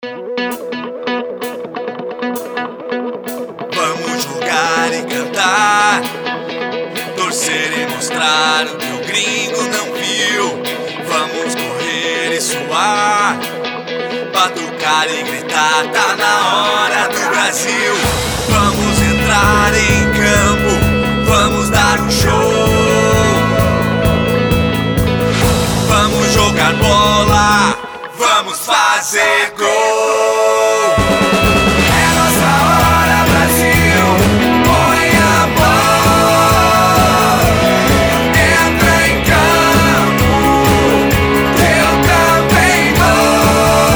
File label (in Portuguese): HINO COPA JINGLE; ITAU